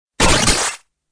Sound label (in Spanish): Grito de Overqwil